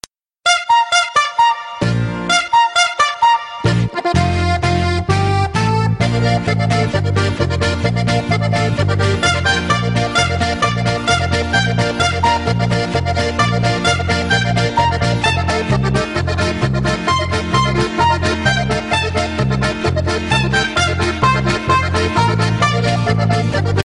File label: Tiroler Horns